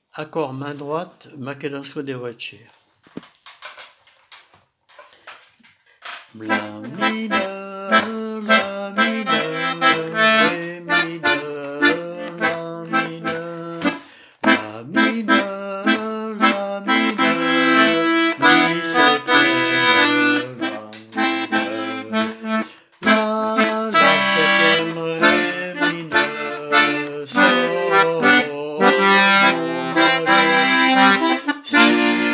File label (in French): Accords main droite MAKEDONSKO